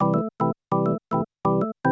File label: SYNTH HOUSE 125 A, Keys; eJay